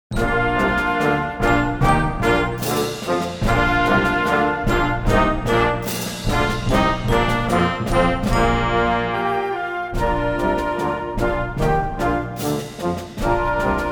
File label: Hallelujah Chorus